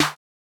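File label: Clap Dust808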